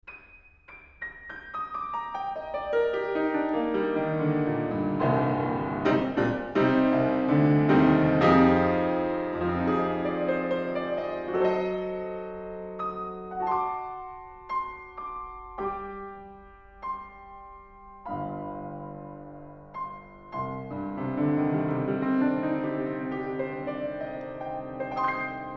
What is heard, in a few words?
Piano, Vert 115cm Mic